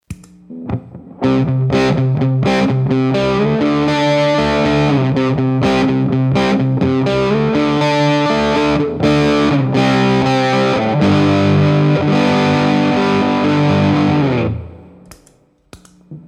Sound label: V8 Russian Muff Bass Big Muff HALF VOLUME